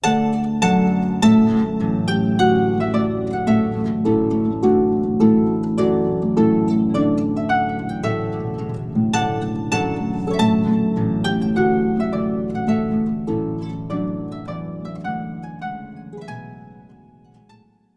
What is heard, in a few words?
Trumpet Tune, Harp